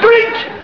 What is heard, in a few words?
drink